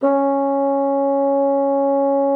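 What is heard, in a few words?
bassoon piano C#4; Xsample VOL-6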